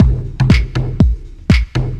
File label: barrel full, Deep House 120bpm